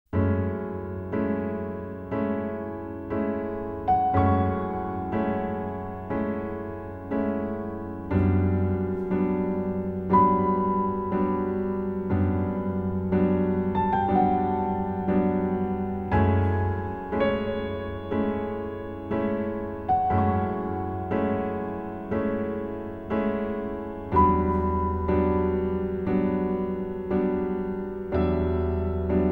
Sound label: piano